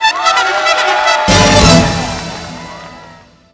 Jackpot; Knight Online

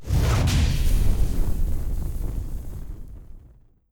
Fire Whip

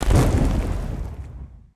Tail Attack

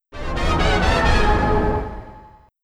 Out of Tune; Hey You, Pikachu!; N64

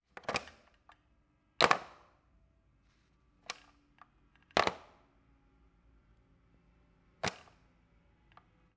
Telephone, Pick Up, Hanging Up, Hotel, Vietnam

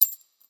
Coin Earned; Clawberta; Android, iOS

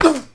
Male Hurt; gamerip; Mars Sortie

